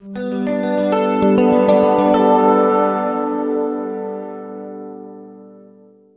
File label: Guitar FX, Stringed Instruments, World Pop; Sonic Foundry (Sony Creative Software)